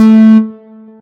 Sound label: Synth A3